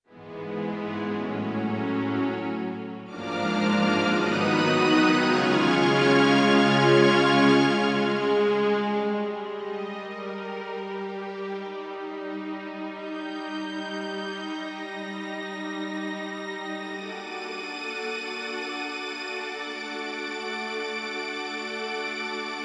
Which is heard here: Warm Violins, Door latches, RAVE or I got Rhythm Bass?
Warm Violins